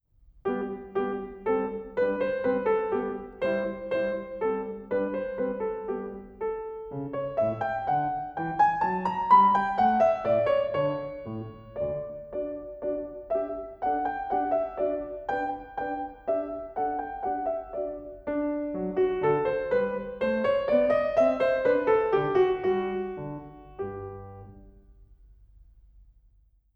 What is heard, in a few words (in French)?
menuet